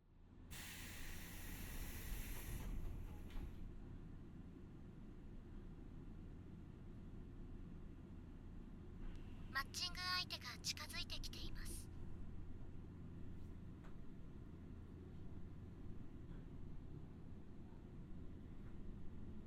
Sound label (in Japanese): フェラチオ; SEあり